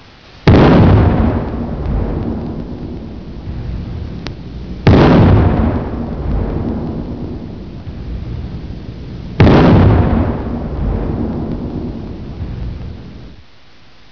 CANNON

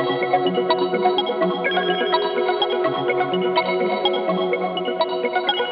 Loopable Tune

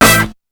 shot; BEAT2000

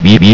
beep beep

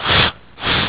SPRAY, CANS; EFFECTS

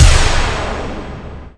missile; NIHILIST

